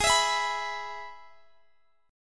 Ab Minor Sharp 5th, Guitar, DADGAD; x,x,18,21,19,21; down

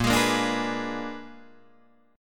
A sharp 7th Flat 9th, Guitar Open D chord

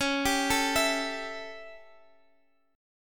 A 7th Db, chord

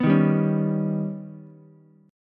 D sharp Augmented 7th D sharp, Ukulele; strummed; 4,3,3,4; JGuitar

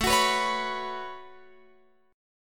A 6th Add 9th, Guitar, Open F; A6add9 strummed

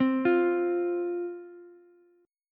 F 5th F Ukulele; arpeggiated; chord; x,x,8,8; JGuitar

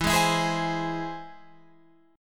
E Augmented, Guitar, Open F; E+ strummed; JGuitar

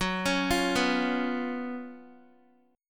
Gb Major 7th Suspended 4th Gb Guitar

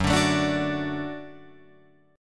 Gb Minor Sharp 5th Gb down Guitar Open F; Gbm#5; strummed; JGuitar

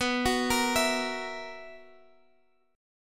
B Major 7th Suspended 4th, Guitar DADGAD chord

